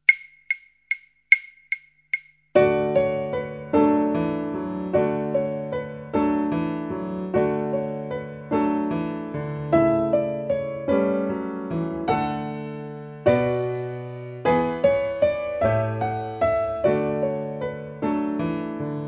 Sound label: piano